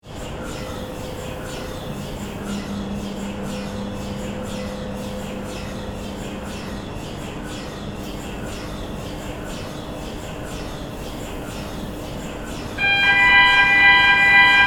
dome rotation; paranal; UT2

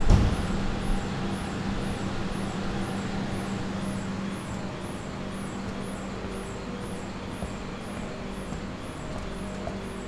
Soundscape: La Silla NTT inside walk

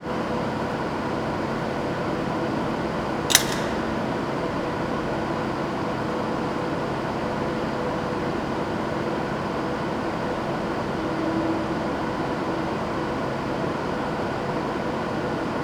La Silla ESO 3.6-metre telescope brake release; soundscape

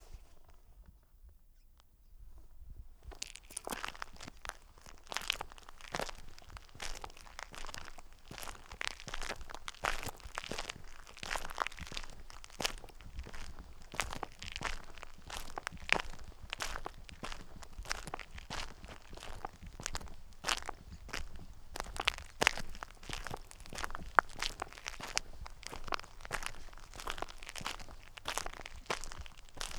walking exterior; La Silla; mono; soundscape

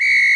paranal software whistle short

